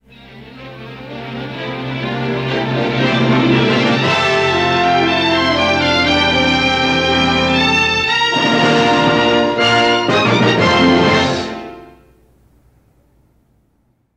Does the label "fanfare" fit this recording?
Yes